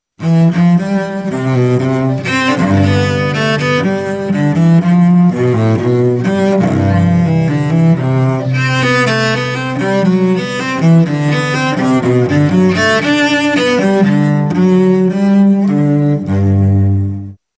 violoncelle

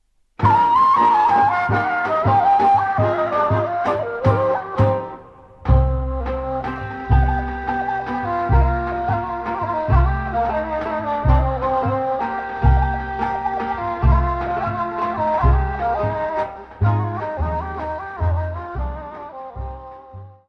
horo - Bulgarie

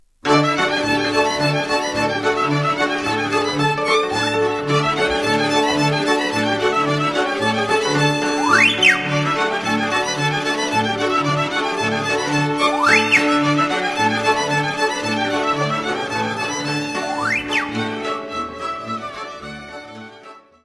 Roumanie; Tintaroiul

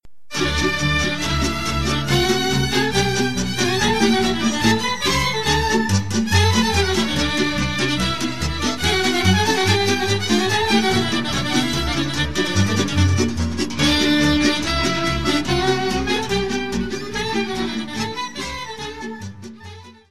Ardeleana, Roumanie

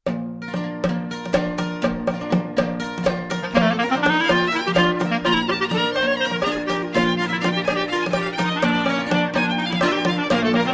Kalamatianos, Grece

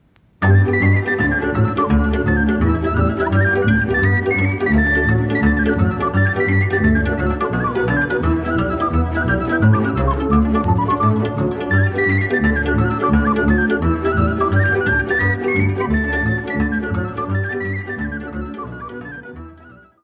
Sirba - Roumanie - Moldavie

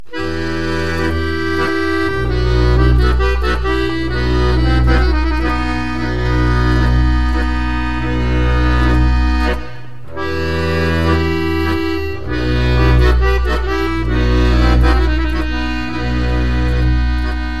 Bavno Horo - Bulgarie

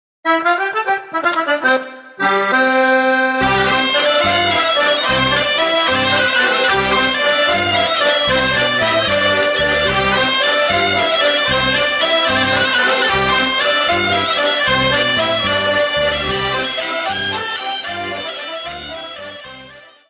La bourree du Puy de Dome france auvergne

